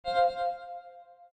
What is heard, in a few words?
button